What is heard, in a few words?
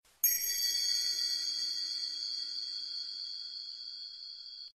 Massage Sound